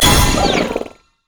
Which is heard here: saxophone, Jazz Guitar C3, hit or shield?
shield